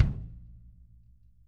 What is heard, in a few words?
KICK R